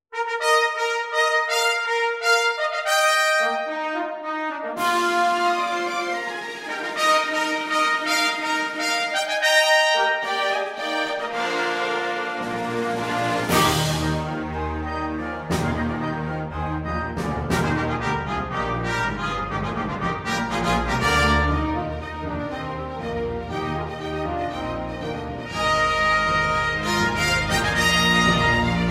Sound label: Fanfare